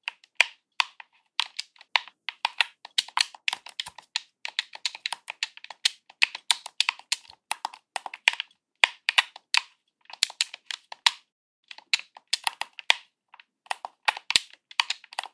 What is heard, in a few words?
Gamepad pressing buttons multi tapping